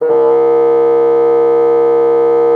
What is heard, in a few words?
MULTI, bassoon; Xsample VOL-6